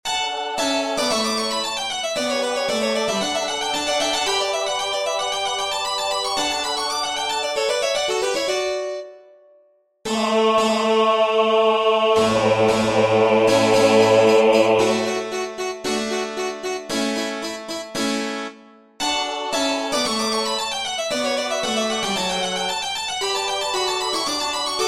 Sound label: bass